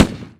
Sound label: shot, tanks